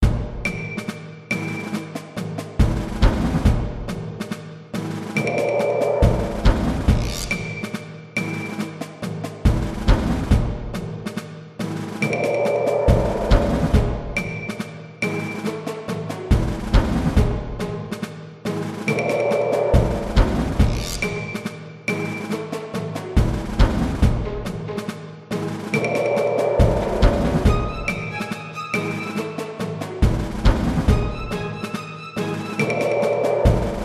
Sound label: music menu; soldiersfury